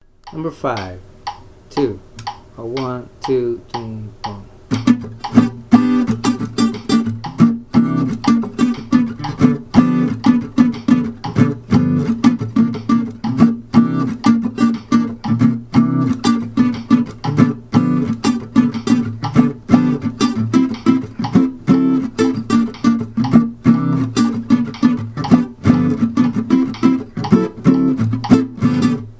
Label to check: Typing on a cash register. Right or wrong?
Wrong